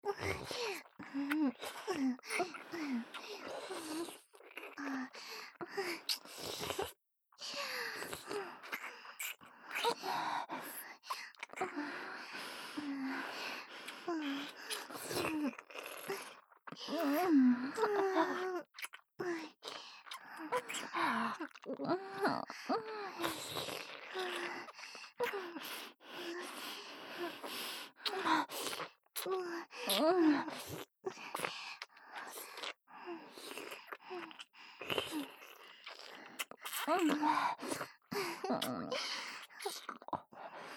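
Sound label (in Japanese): 作業喘ぎ＋ディープキス; 小春; 美冬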